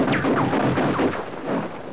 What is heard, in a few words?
GUNSHOT